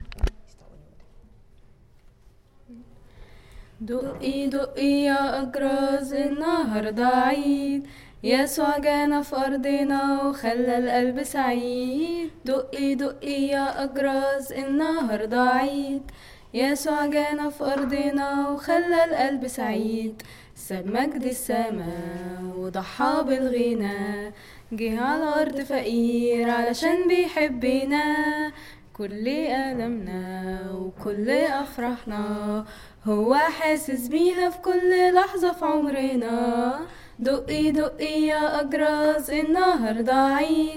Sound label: Jingle Bells